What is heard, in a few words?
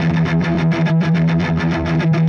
Chunk Guitar; Sonic Foundry (Sony Creative Software); Indie Rock Anthems; Session 02 - Clinical